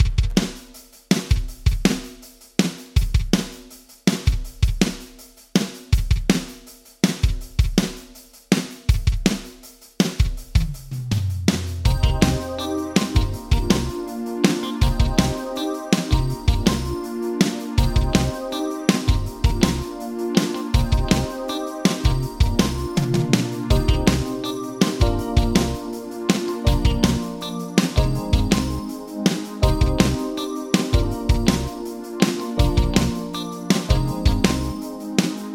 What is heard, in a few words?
Drums Yamaha D5000 Mod Dimension Vintage Digital